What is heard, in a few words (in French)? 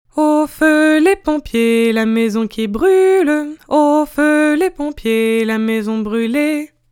au feu les pompiers, intervalle